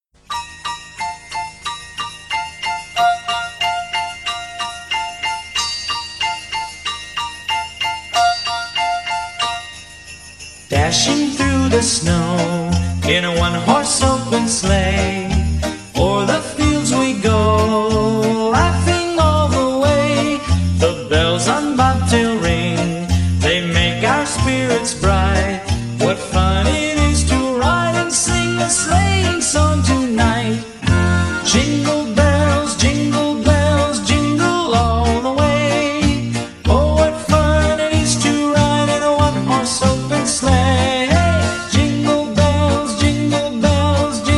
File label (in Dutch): Jingle Bells vertraagd Bes maj